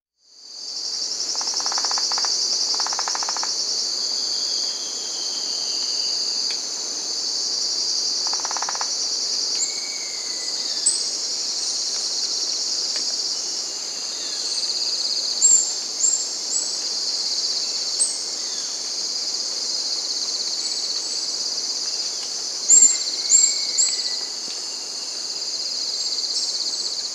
Rufous-breasted Piculet (Picumnus rufiventris), Ecuador; S. America